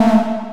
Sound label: ui error; anvil